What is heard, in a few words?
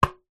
sport tennis ball bounce on racket; zapsplat